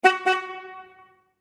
Auto Horn Honk City Two Times Automobile Car; Vehicle; Cars; Vehicles

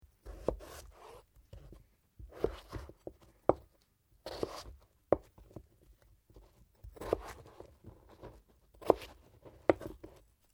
POTATO SLICE; Various food preparation; Food Prep (Sound Effects:Restaurants)

